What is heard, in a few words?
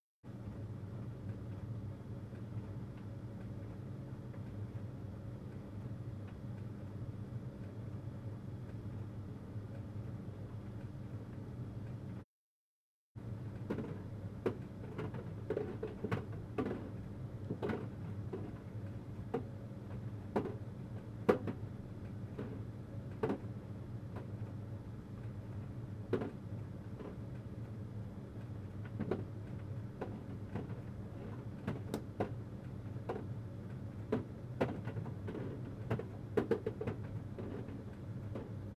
dryer balls